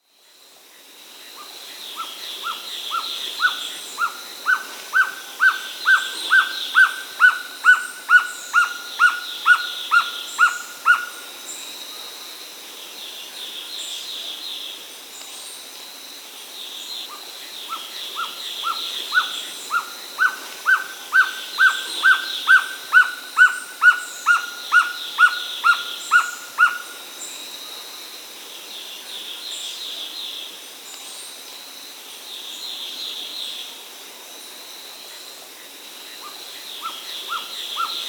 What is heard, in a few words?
Amazonian Violaceous Trogon (Trogon violaceus), Peru; S. America